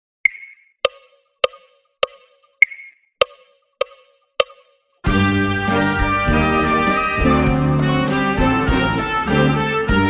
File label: smooth 108bpm